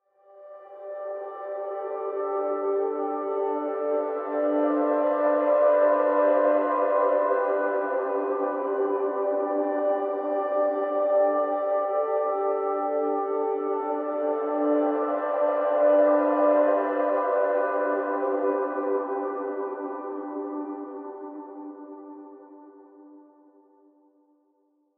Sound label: Beautiful Atmosphere Pad D Minor